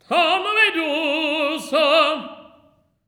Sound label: TEN. TRAD; Spectrasonics; Symphony of Voices